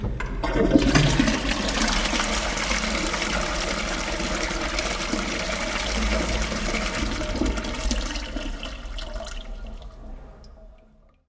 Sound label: public convenience